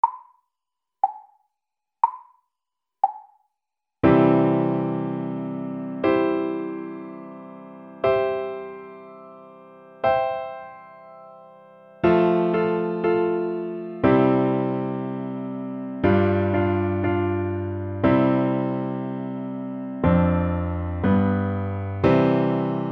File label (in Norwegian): Trommelspiller